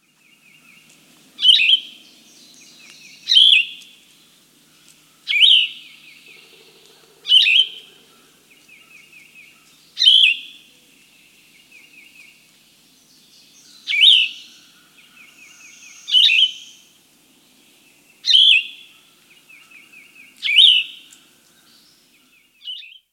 Yellow-throated Vireo; Birds

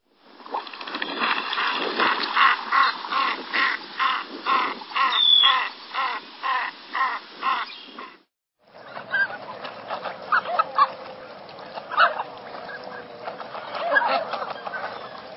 Canvasback; Birds

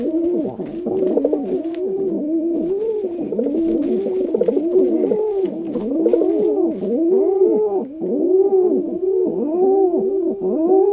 Rock Pigeon; Birds

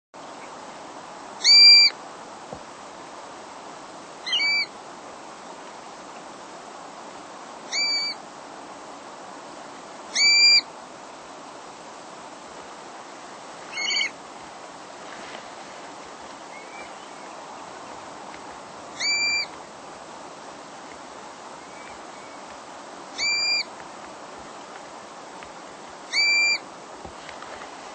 Black-bellied Plover; Birds

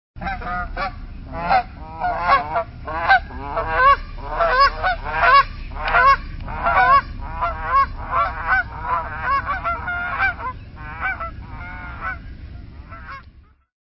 Canada Goose, Birds

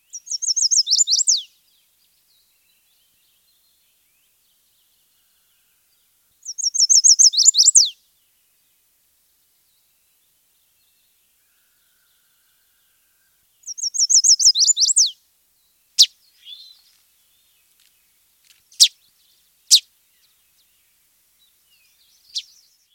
Chestnut-sided Warbler; Birds

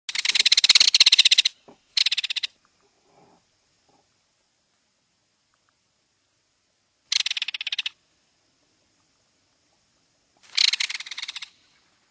Belted Kingfisher; Birds

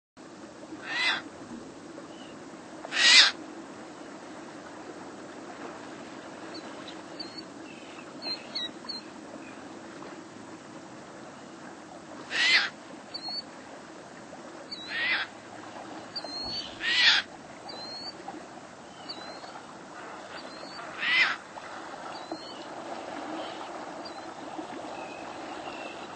Caspian Tern; Birds

